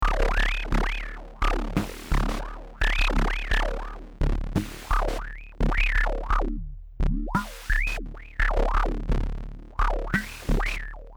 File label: beat 86